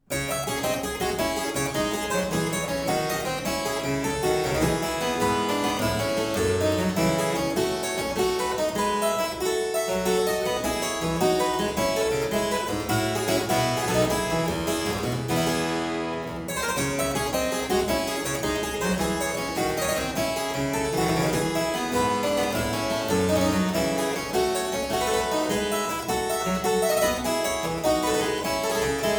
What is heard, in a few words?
Suite in D minor Gigue, Harpsichord; Buxtehude; Complete Harpsichord Music